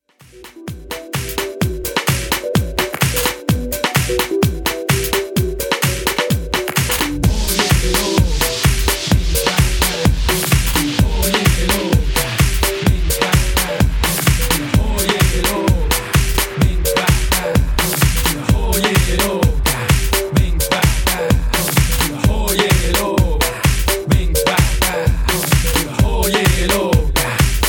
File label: Extended Mixshow 128Bpm